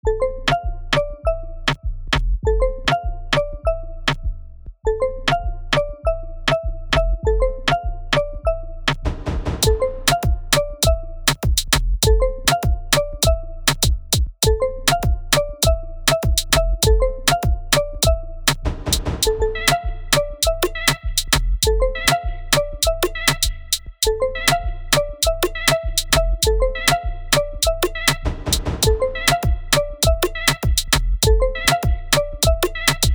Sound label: Reggaeton